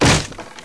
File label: wood break; minetest